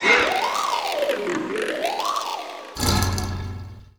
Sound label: die